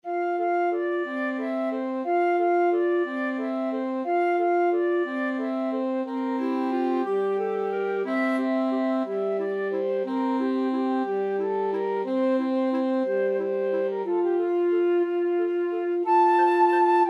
cielito lindo, Flexible Mixed Ensemble - 3 Players